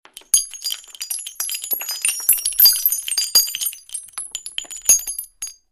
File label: Various Shell Ejects